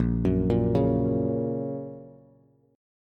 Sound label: A sharp Minor Sharp 5th, down, Bass